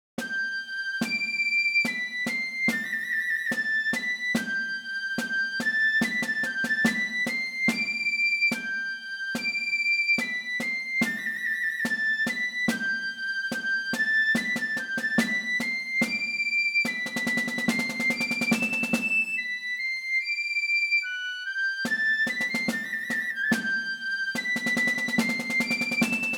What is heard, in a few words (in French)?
retraite mousquetaires